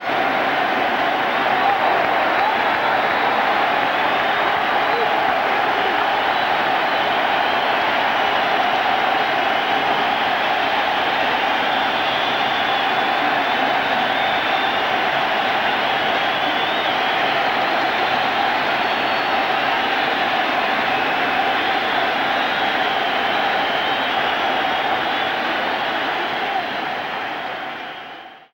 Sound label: Baseball Crowd Cheer; SFX Kit; Crowds